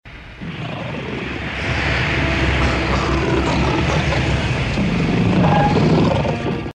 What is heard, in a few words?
Chevy engine